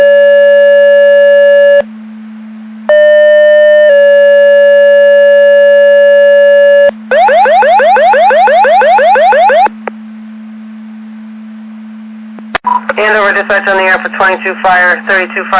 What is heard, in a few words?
Siren; Andover Twp Boro